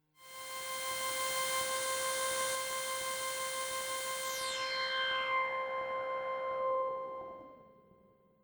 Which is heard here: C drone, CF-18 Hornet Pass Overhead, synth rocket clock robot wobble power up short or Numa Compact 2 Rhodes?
C drone